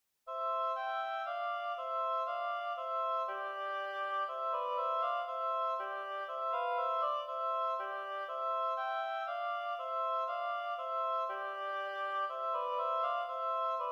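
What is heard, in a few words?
rondo oboe duet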